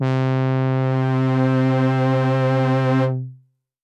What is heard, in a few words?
Synth C, Synth Prophet One Shots; Electronisounds